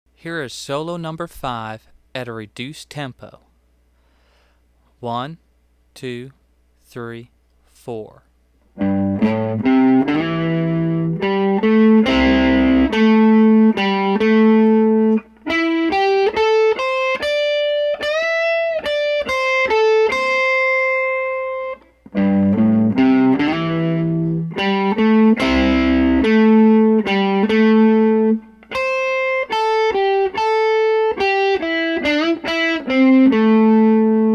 Solo 5 Slow

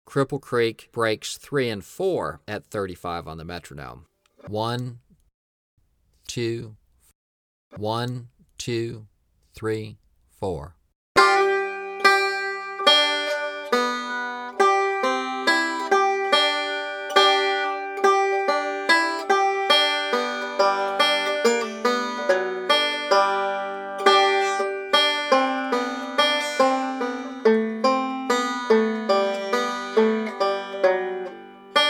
35 Bpm; Cripple Creek Breaks

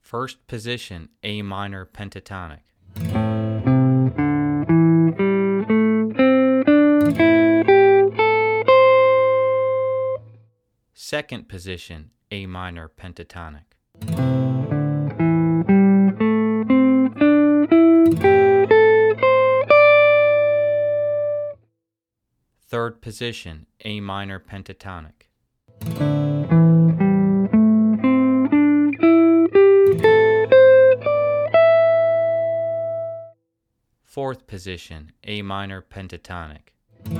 Positions Of Am Pentatonic

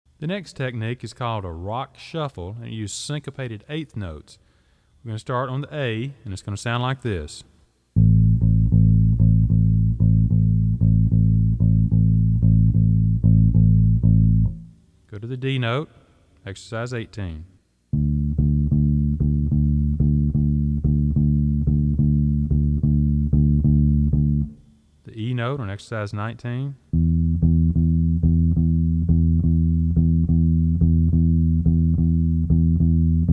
Rock Shuffles

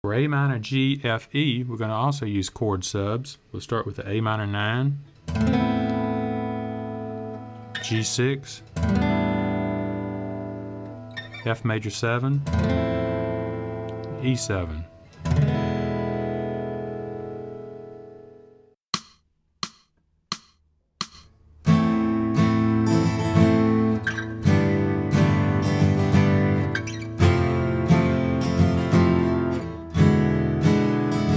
Am G F E Progression Chord Substitutes

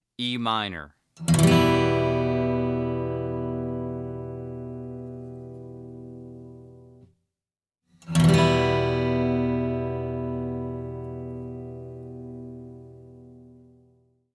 E Minor Chord

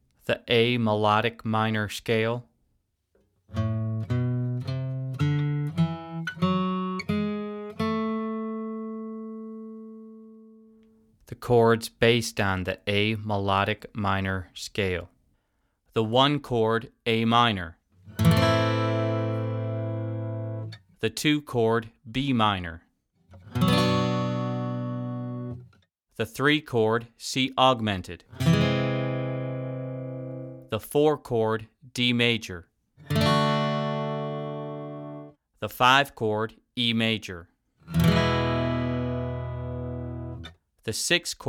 Melodic Minor Chords

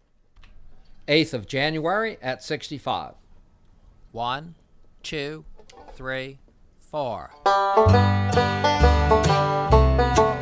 8th Of January @ 65 bpm

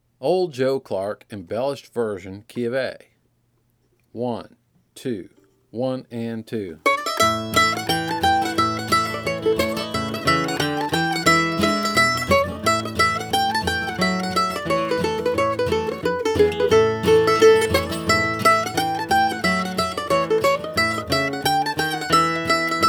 Old Joe Clark Embellished

